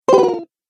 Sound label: Retro Videogame Digital Hurt